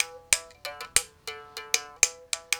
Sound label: mouth bow, Africa